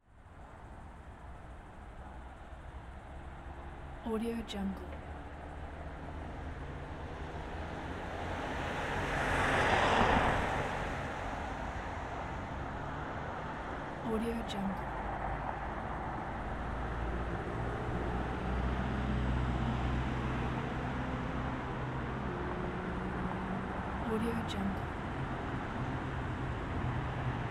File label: low density city street traffic on a summer night; urban sounds